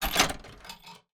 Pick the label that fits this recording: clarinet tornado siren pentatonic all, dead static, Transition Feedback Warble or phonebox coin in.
phonebox coin in